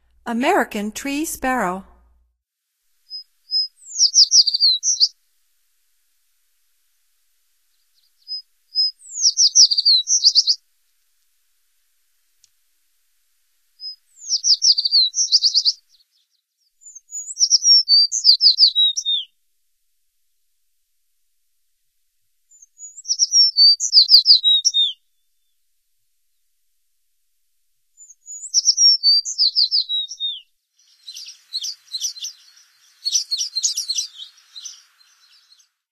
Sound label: American Tree Sparrow, Songs of Birds of North America; Stokes